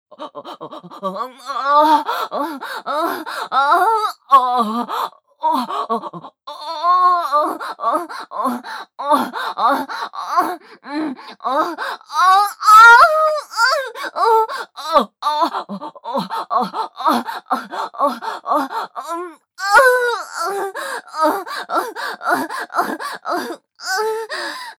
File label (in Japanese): 騎乗位オホ・激しい, ASMR; loop音声